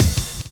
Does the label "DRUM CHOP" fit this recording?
Yes